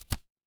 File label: paper fabric card flip